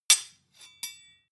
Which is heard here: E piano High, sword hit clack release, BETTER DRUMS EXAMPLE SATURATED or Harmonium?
sword hit clack release